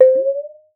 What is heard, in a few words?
water; Xsample VOL-9